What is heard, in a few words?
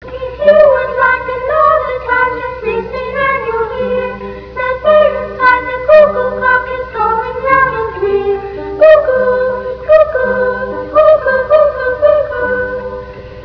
Cucukuclock